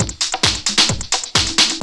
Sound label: GROOVEE, DIRTY DR. LPS